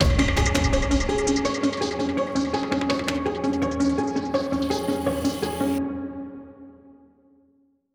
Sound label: Basic Mood Alteration